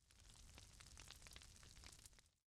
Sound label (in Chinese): 碗特写背景音